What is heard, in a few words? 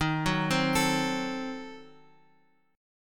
Eb Diminished 7th, Guitar Open F; Ebdim7 arpeggiated; x,x,3,1,3,4; JGuitar